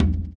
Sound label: surdo; ILIO; Ethno Techno